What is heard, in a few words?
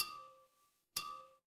glass glass tap